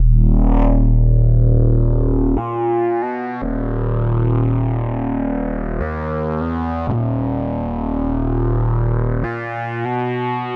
Flawss Bass, Drum n Bass; KJ Sawka; Drum n Bass & Beyond

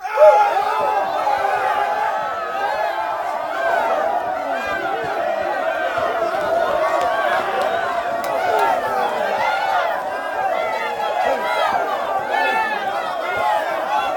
AF FIGHT, AF.CHEERS; Spectrasonics; Heart of Africa 1

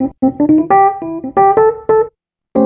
ACID JAZZ; Best Service; Advanced Media Trax Modern Composer

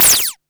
LASER GUN

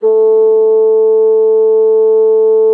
bassoon, A3; Xsample VOL-6

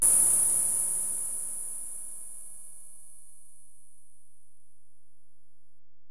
HiHat, Alesis DM-PRO

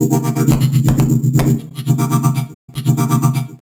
Melody, IDM

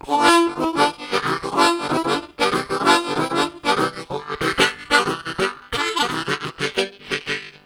Chatty Bass, Harmonica; Zero G

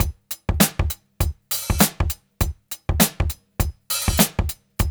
DRUMS, Loops; East West; Funky Ass Loops

